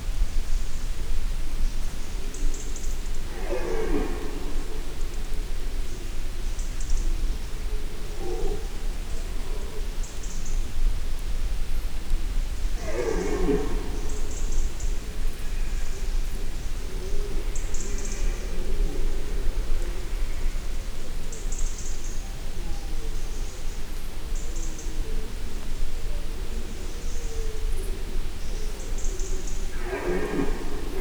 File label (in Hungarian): madarak légáramlás bőgő bikák, gímszarvas